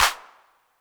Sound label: Clap B 808 Tape; Hand Clap; Clean